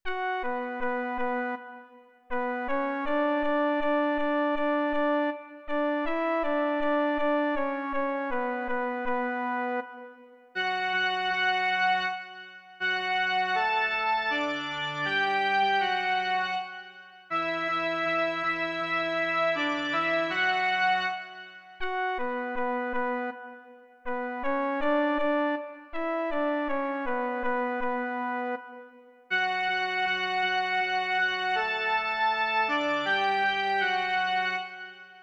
Hospodine, z hloubi srdce litujeme - jen melodie; Doprovody Farského liturgie

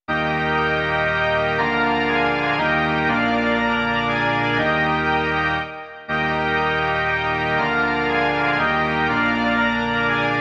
varhany; Již tedy s Bohem jdi

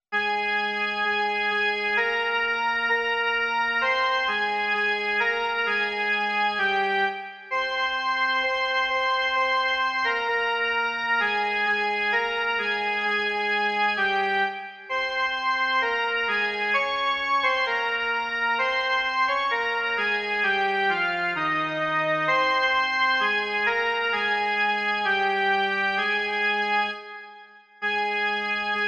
jen melodie; V ruce Tvé, Pane

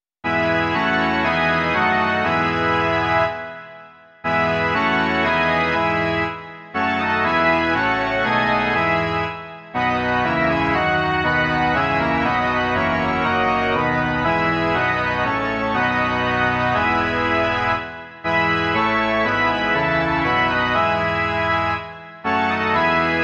varhany

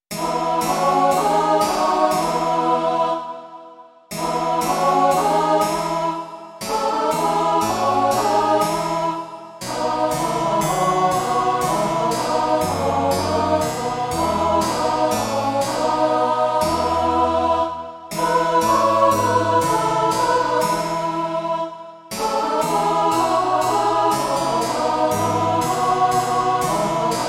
dětský sbor a cembalo; Hospodine, všech věcí pane; Imaginativní verze

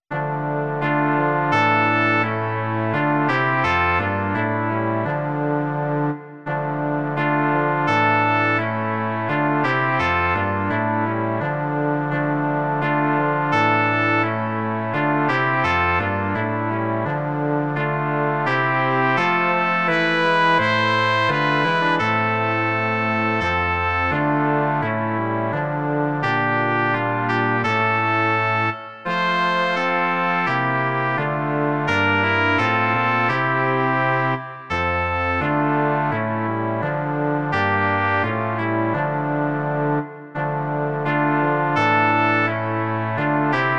žesťový kvartet; Velký Bože, my se Ti klaníme; Imaginativní verze

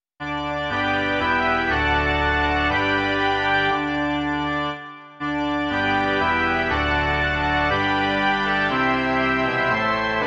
varhany; Buď Bohu všechna chvála, čest

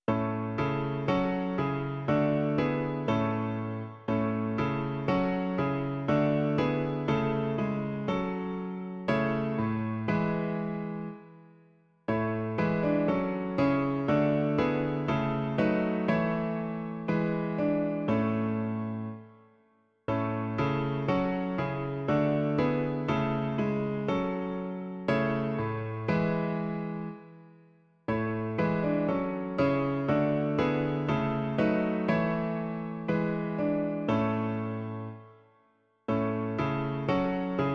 Svatý Bože lásky plný - klavír; Klavírní verze

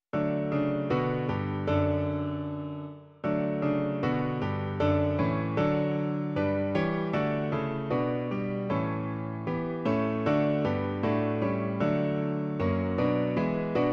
klavír, Klavírní verze

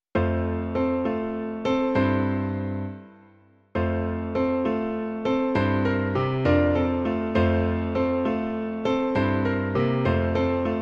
Přišlo jsi k nám, Jezulátko - klavír; Klavírní verze

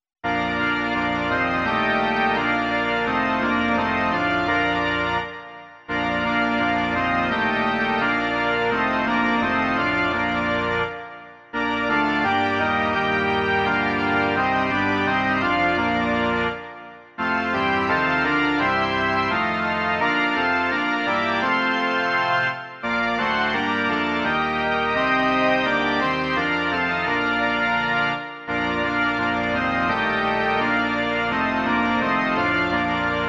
varhany

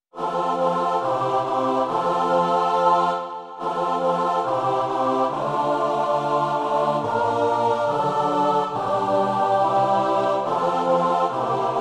pěvecký sbor; Kde je dobrota a láska; Imaginativní verze

